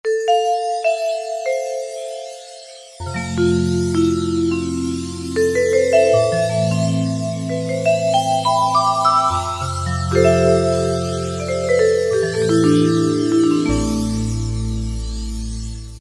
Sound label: Mad Vibes; Factory Preset examples